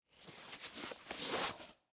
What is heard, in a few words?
Tennis Shoe Off, Foley, Tennis, Shoe, Take, Off; Shoe Sound Effects; Shoes (Sound Effects:Objects); BlastWave